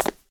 marble land; Golden Crayon Sounds

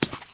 concrete step; Golden Crayon Sounds

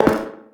pipe step; Golden Crayon Sounds

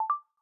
speech recognition did end; iOS 26 Sounds

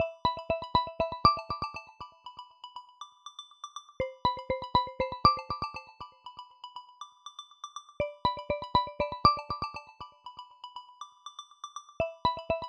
Nano Ringtone; iOS Sounds

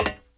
metal step; Golden Crayon Sounds

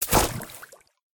water step; Golden Crayon Sounds

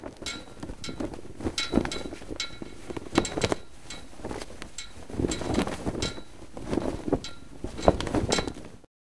flag; Original TK Sounds

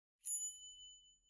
Shooting Star Sound Effect, iOS Sounds

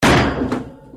steel fall; Golden Crayon Sounds

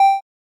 elevator beep; Golden Crayon Sounds

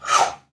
Warp Speed; Timecrest Music

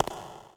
cave grounds step; Golden Crayon Sounds

